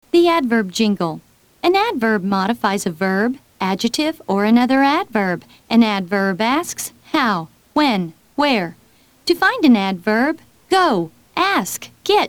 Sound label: adverb jingle